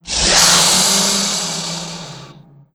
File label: aware